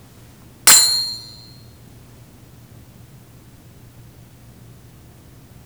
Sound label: cymbal